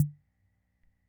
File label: Keynote MR-101 Auto Rhythm Perc; Keynote MR-101 Auto Rhythm Sample Pack